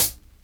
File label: HIHAT HIP; Best Service Dance Mega Drums